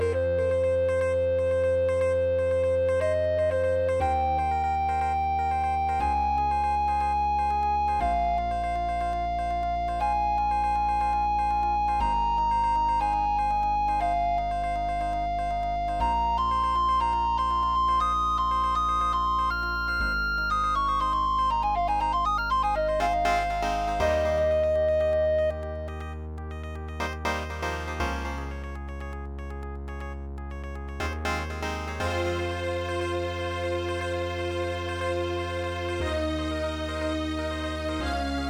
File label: dungeon; Dicey Dungeons soundtrack; in-game